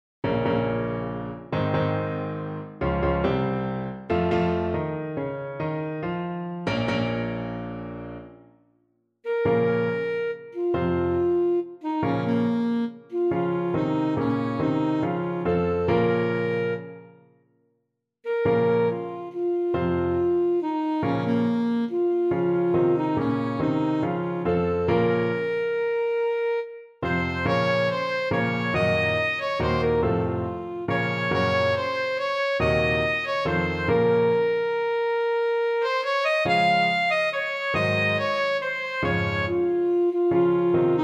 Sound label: ASAX, saxophone